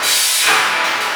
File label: BASE, ELECTRONC